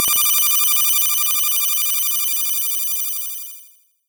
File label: alien beam